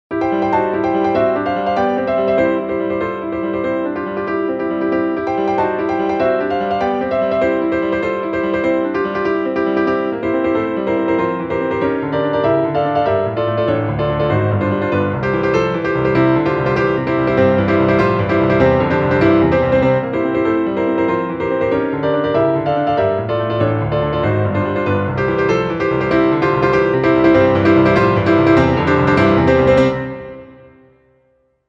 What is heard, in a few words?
piano; schumann; 子供の情景 第９曲「木馬の騎士」; シューマン